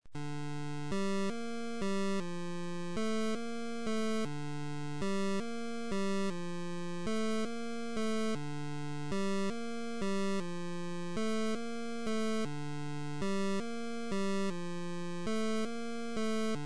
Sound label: music; chiller; nes